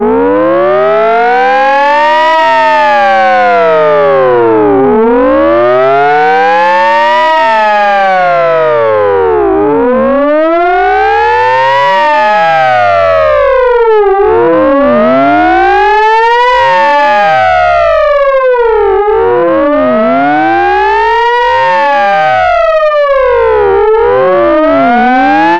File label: fire truck piercer siren